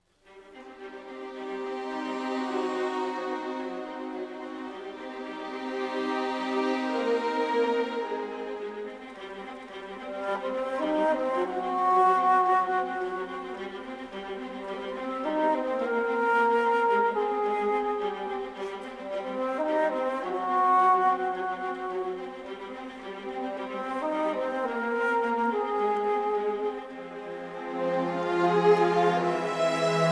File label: Lite 108BPM 4-4; Evil Genius 2 World Domination; PS4; Xbox Series X/S